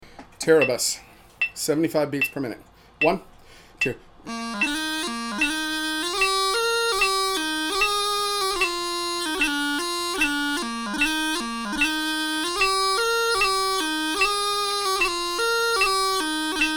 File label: 75bpm, bagpiper; Teribus; Grade IV QMM; Jerry Finegan